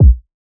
Kick - C; Drum One Shots; Kicks; Cymatics; Eternity Sample Pack

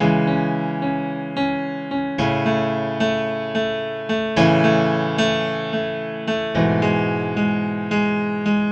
Grand Piano - 110 BPM (E Major); Heartache; Ghosthack; Free Guitar And Piano Samples

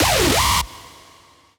Techno Synth Shot C; 45 Techno Synth Shots 2025; Synth One-Shots

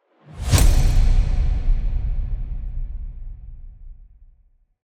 Impact

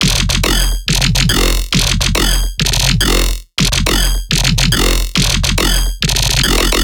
Riddim Bass Loop F Oldskool 140; Ghosthack; Riddim Essentials 2020

